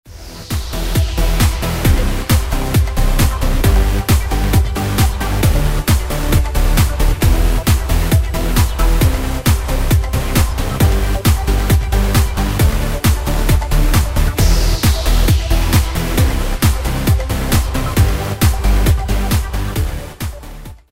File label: Techno Dance